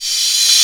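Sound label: crash reverse, Dance Mega Drums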